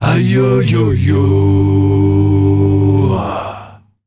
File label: MALE 5THS, World Voices, S.AFRICAN; ILIO; Vocal Planet VOL-5